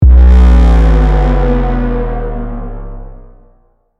Boss Intro; Caveblazers; Grub

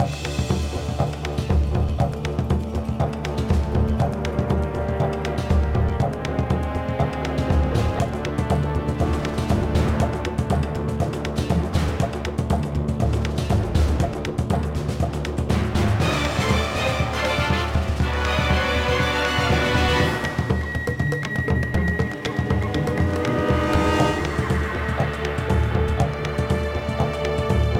Jungle Action Low

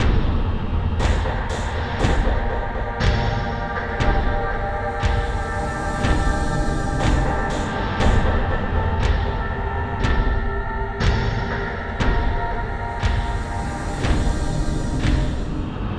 Success Tonal B6; Insanely Twisted Shadow Planet

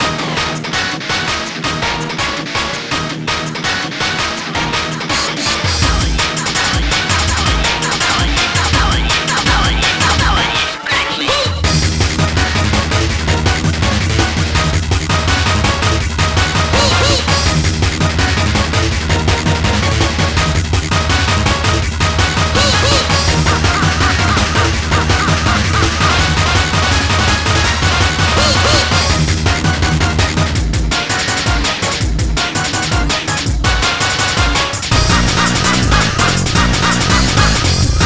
Electrometal Scratchin'; Haltmann's Highest Quality Video Game Rips

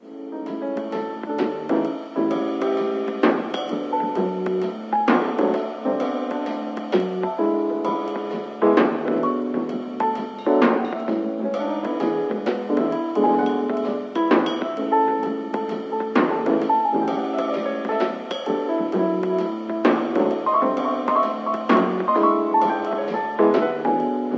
Gramophone; ether one redux gamerip 2015

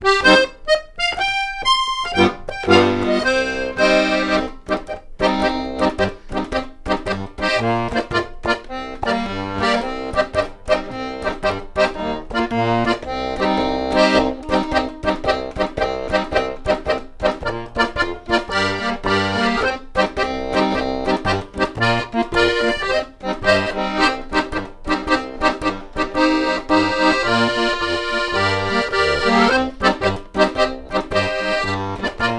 Accordion; Assassin's Creed Syndicate

